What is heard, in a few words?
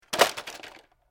RC Car Toy Car Drops On